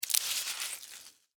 Spider Bite Fang, Spider, Bite, Fangs, Arachnid, Insect, Bug